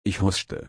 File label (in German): husten